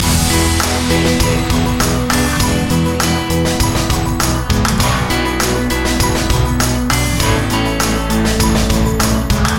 positive flow loop

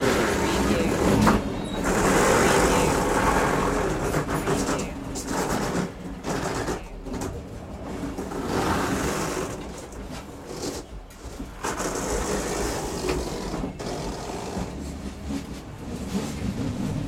between train wagons

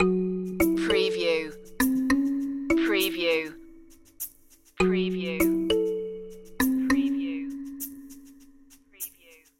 kalinka loop

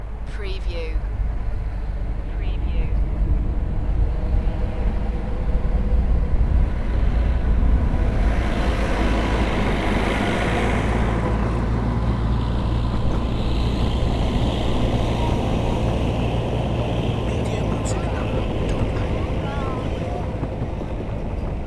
Narrow Gauge Railway Diesel Engine; Fairbourne; beanstalk audio

